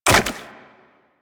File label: Wall Jump Goo; Footsteps; Warframe; Tenno; Wall Run